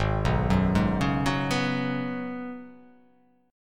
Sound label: Ab Minor 11th, Ab; Abm11 arpeggiated; JGuitar